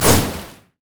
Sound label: foxfire missile; LoL; ahri